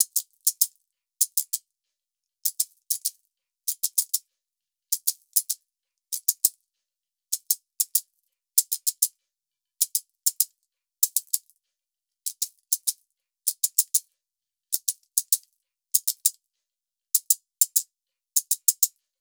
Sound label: shaker 098; 098bpm; Hip Hop India Modern Percussion